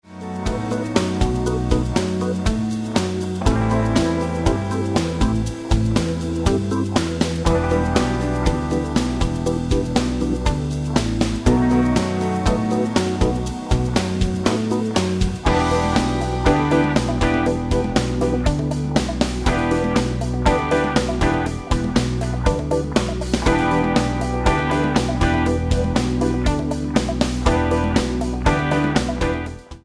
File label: 24 Bar Blues Backing Track in Em; Blues City